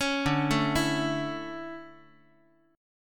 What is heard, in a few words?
D Major 7th Suspended 2nd, Guitar